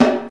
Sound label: ska acoustic snare sample g sharp